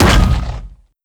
step; creatures; strider; seq passby

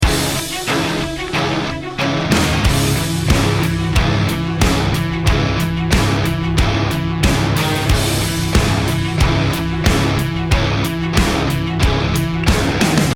start round, music

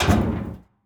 grab, door

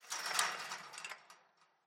chain link rattle, metal; Half Life Alyx

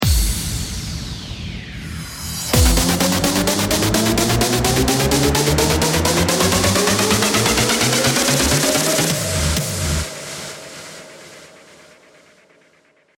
round ten sec count, music

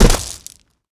land gravel, footsteps; player

